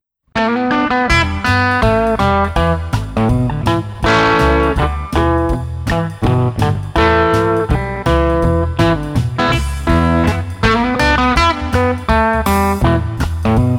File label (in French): Gt propre